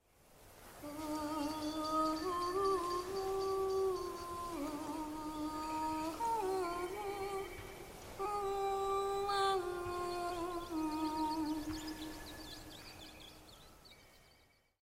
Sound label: singing; Farcry Faith